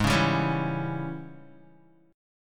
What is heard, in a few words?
Ab Major 7th Suspended 4th Sharp 5th Ab down Guitar; strummed; JGuitar